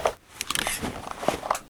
Inf Weapons Foley; Red Orchestra 41-45

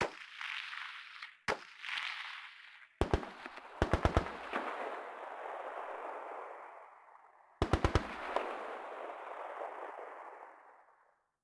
automatic, combat atmosphere; World of Tanks Blitz

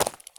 run slate; Escape from Tarkov

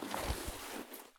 prone gravel; Escape from Tarkov

